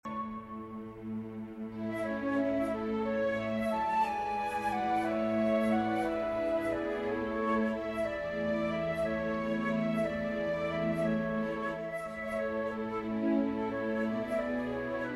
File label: for flute strings; Schubert, Franz Peter